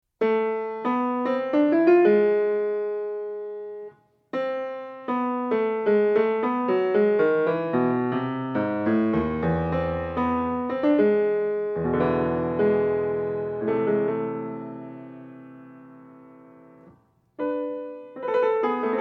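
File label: Prelude in a, piano; BWV-895; Bach; Prelude & Fugue in a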